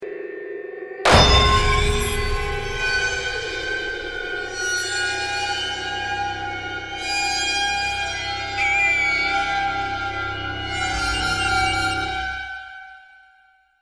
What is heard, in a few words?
sting climax